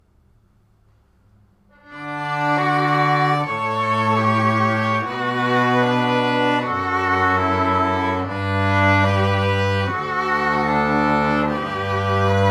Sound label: 2 Violins and Cello Trio Canon; Pachabel's Canon